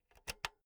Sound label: insert jack cable